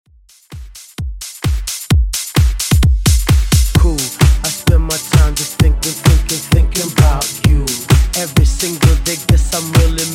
2000s Throwback Redrum 130 Bpm Clean

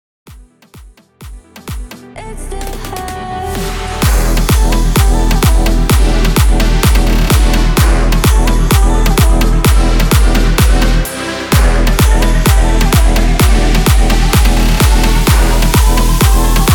128 BPM

